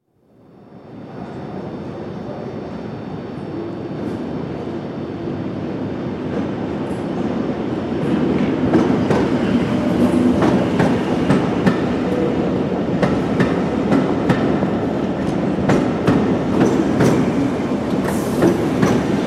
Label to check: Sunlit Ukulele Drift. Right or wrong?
Wrong